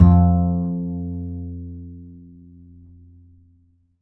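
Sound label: double bass F2, Jazzistic; DBASS; Univers Sons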